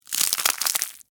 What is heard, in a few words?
Texture, Crack, Crunch, Ice, Cream, Cone; Food Sound Effects; BlastWave